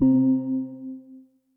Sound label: Synth C, Synth Prophet One Shots; Electronisounds